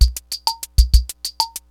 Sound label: Univox loops; Rubber Chicken Software Sample Bank